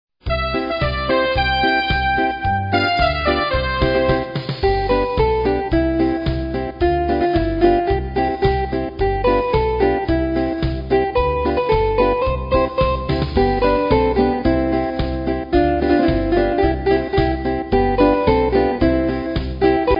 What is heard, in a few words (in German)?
Posthorn